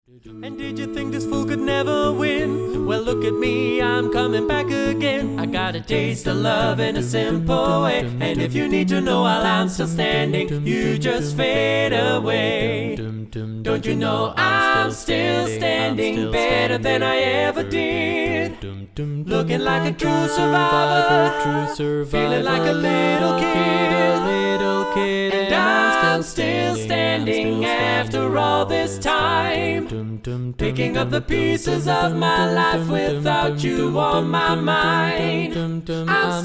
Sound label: male